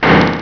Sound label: BANG